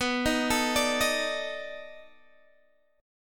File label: B 7th Sharp 9th B down Guitar Standard; B7#9 arpeggiated; chord shape; JGuitar